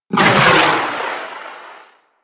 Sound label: Artillery Barrage Explosion Far; Weapons; Powers; Warframe; Archwing